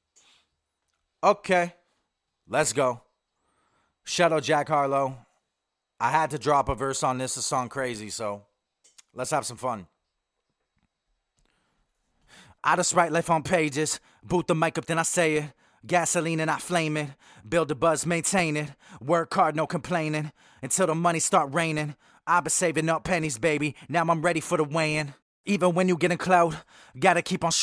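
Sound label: hip hop, 75, F sharp major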